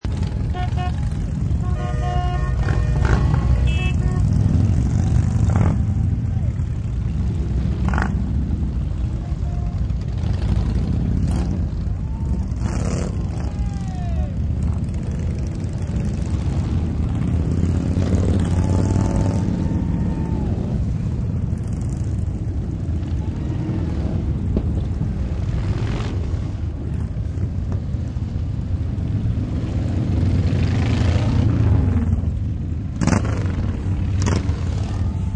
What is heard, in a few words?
Biker Gang Horns, Biker gang drives by with horns; Thousands of motorcycles drive by at Rolling Thunder Memorial Day Rally near Pentagon; Vehicles